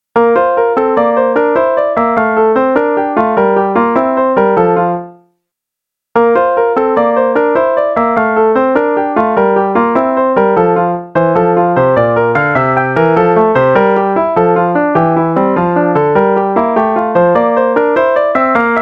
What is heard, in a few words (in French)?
gigue si mol majeur